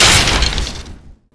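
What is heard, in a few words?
Quick skill Sever Bone; Gamigo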